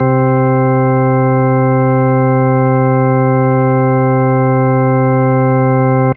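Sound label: organ C3, Keys; Polyend; Simulacrum Sounds; 1 Shots; Acoustic and Electric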